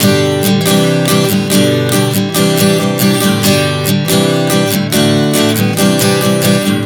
G-C-D-C 140 bpm, acoustic guitar chord progressions